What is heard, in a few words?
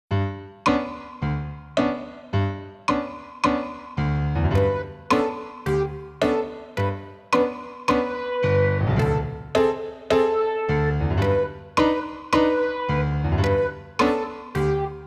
Fast Accompaniment